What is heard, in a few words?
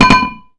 Mining Now